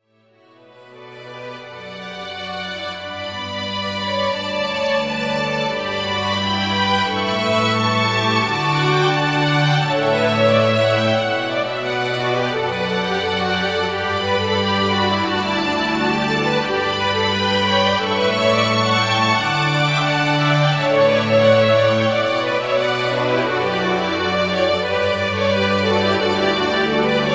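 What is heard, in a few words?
town bgm, town background music; 3rd anniversary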